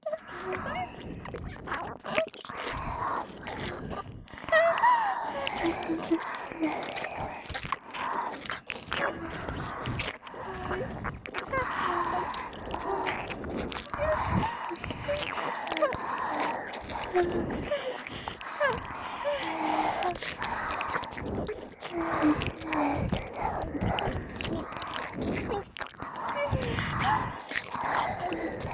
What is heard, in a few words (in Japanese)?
イラマ＋両耳舐めループ（通常ver）